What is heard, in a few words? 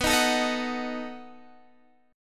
B 7th B down